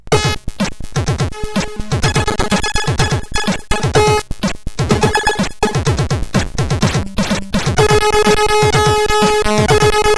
Hurting the Ground sid CSG8580R5

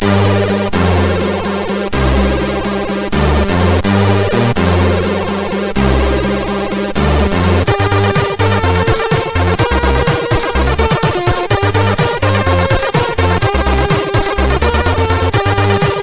Vibration, CSG8580R5; sid

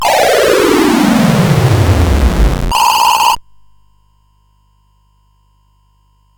Dragonworld sid CSG8580R5

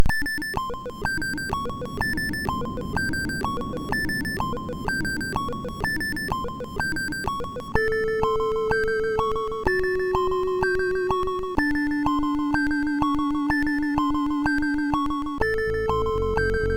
Horror Song, MOS6581R4

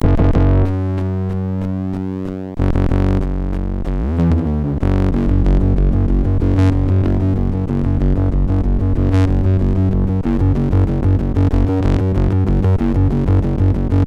Heavy Phasor, sid MOS6581

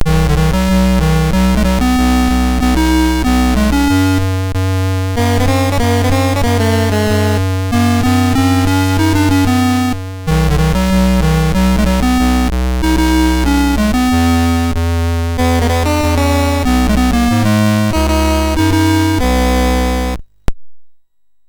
sid, MOS6581R3

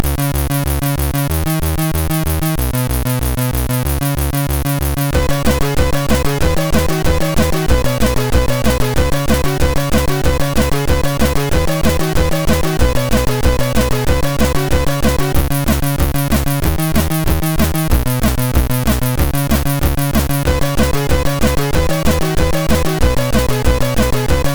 Cool Compo Tune, MOS6581R3; hvsc

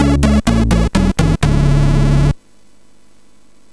Ingame Jingle, MOS6581R4

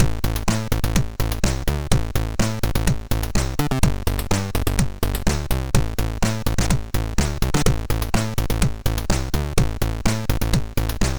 Loop; Roly; MOS6581R4

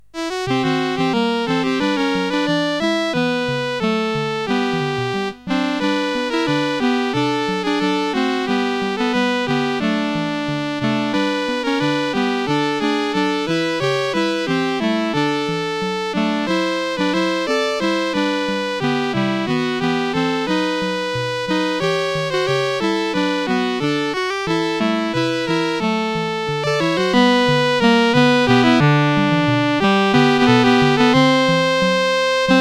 CSG8580R5

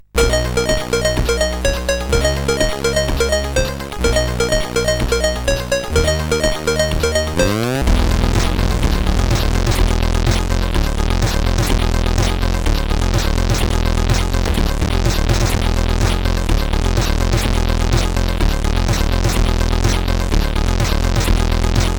Orion Intro, CSG8580R5

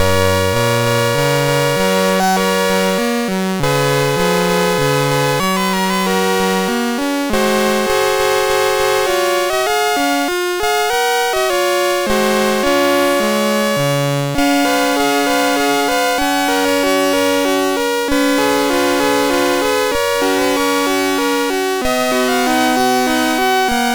Digi Hits, MOS6581R3

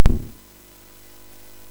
SFX, MOS6581; Narco Police; MOS6581R4; hvsc

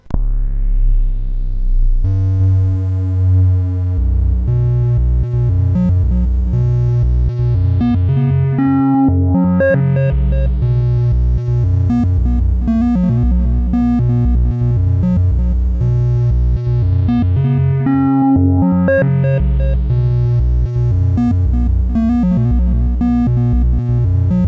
Page Beep, sid CSG8580R5

